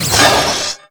Multiplayer SFX - Clam Blitz - Power Clam Generated by Enemy Team